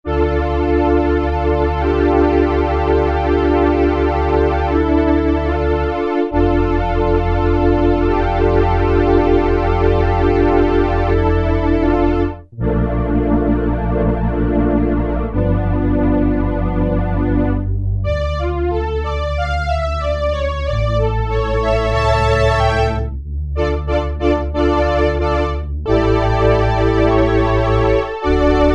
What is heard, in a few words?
Strings, Korg PolySix; Factory Presets Bank A